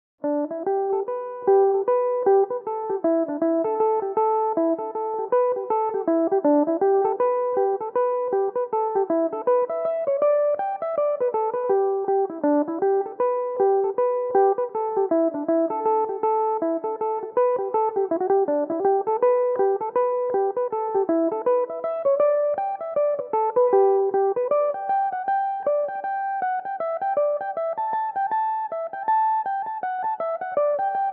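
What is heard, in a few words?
reel G major, Irish mandolin; Mullin's Favourite; Belmuse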